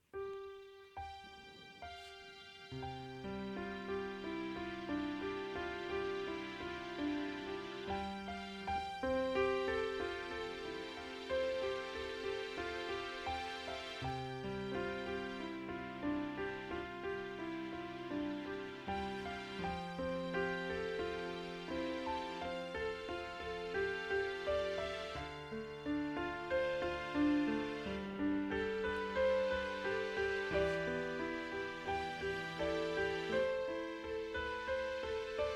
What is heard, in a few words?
Instrumental